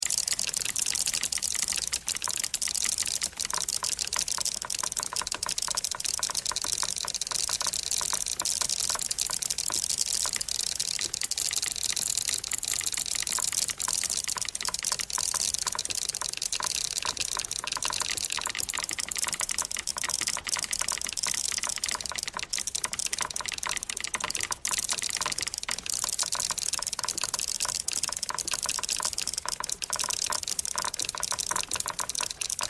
typewriter thread harmonic all

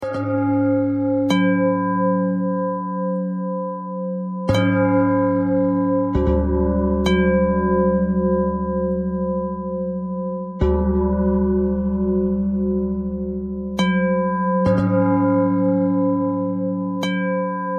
bell bell thread noise

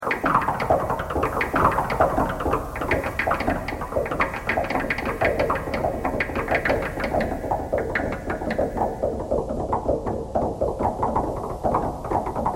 knocking thread noise all

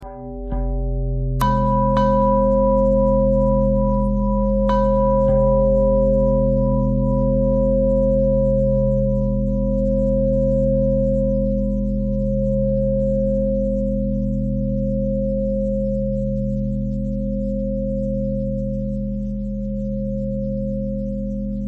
bell bend threads; bells

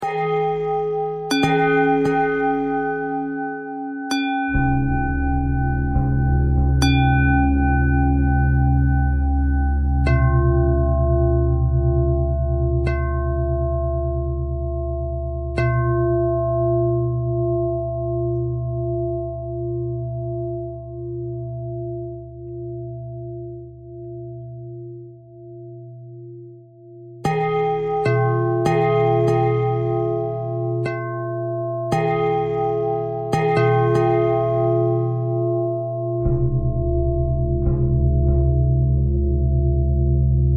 bell bell thread simple, bells

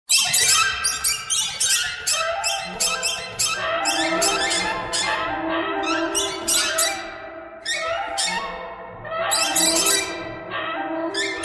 birds

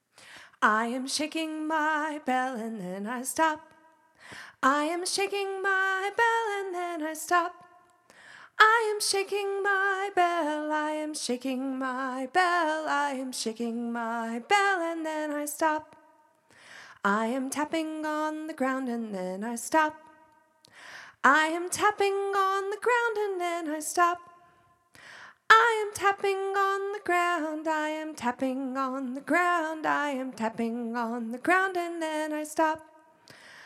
shaking my bell

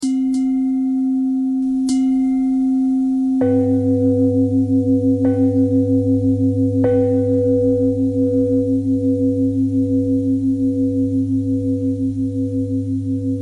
bell bell thread simple, bells

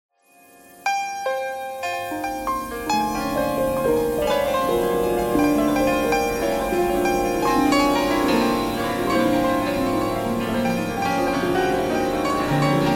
piano thread noise all

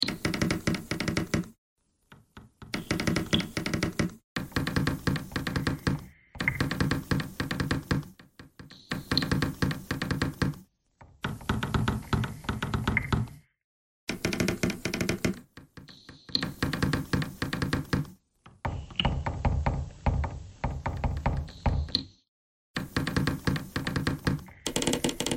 bagpipe thread noise

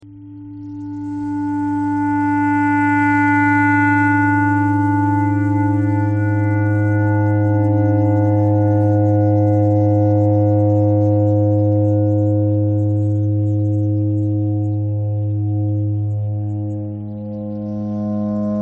clarinet thread noise

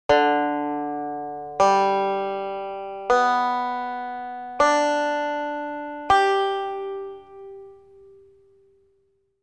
tuning check banjo; Hear all strings in sequence

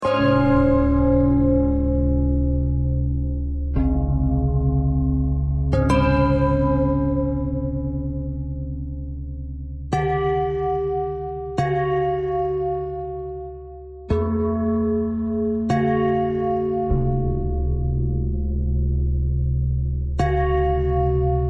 bell thread

